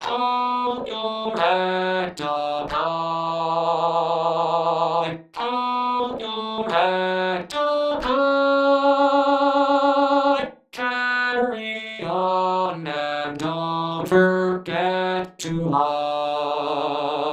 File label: vocals 180bpm